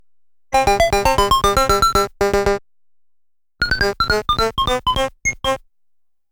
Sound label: Monster Byebye Music~Round Clear; Namco Graffiti 1; DIG DUG